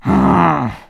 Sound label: Hmm; Ravio: "Hmm..."; zelda